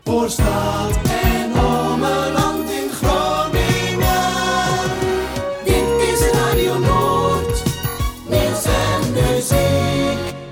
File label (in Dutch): omroep jingles